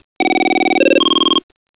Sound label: ring pattern